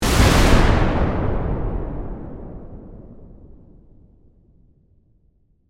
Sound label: Explosion Massive, Warfare, Explosion, Massive; Bomb Sound Effects; Explosions